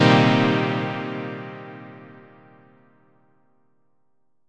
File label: stab satisfying